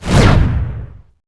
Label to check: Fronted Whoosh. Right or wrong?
Right